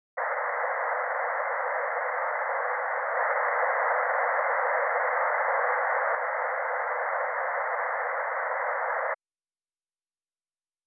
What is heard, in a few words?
Surround-Sound speaker balance; Surround Sound Setup and Imaging; Surround Spectacular